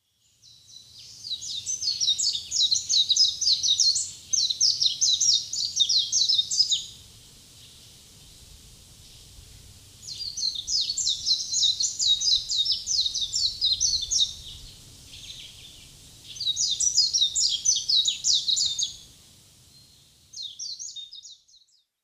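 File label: Japanese White-eye; mejiro; kokubunji; koe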